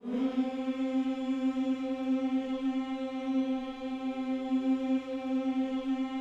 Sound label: HMMS C 4, GOSPEL HMM; Spectrasonics; Vocal Planet